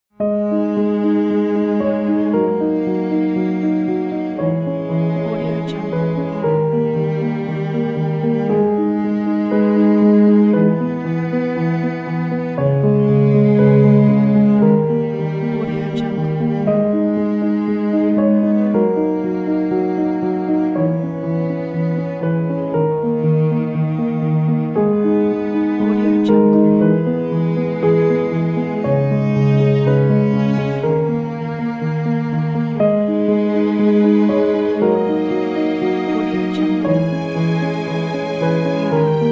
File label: emotional sentimental